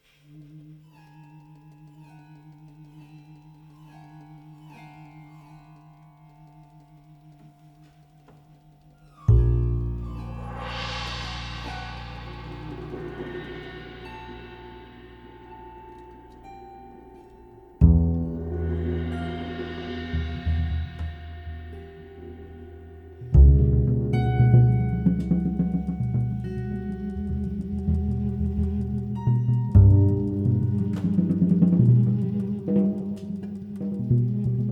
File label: Interlude